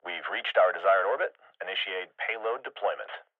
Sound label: satellite Deployed